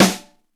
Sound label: HOUSE; 90 sSampleCDs; Crypton - DrumBase5000